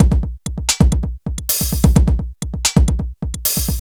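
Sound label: drum loops, right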